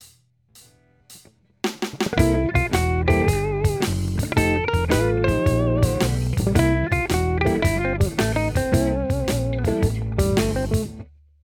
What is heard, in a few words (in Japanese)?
運指練習 応用